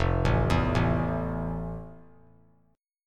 F Major 7th F; arpeggiated; JGuitar

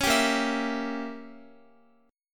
A sharp Diminished 7th Guitar, chord; A#dim7; strummed; x,x,11,12,10,11

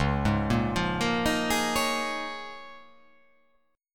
C sharp 6th Add 9th C sharp down

